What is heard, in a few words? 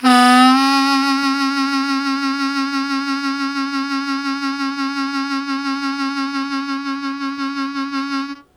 Strong Vib w Scoop C#1, Harmonica; Zero G